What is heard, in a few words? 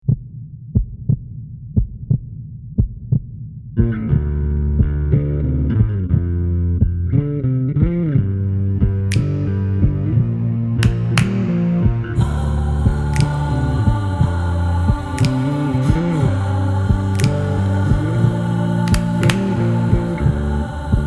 Zombie Heart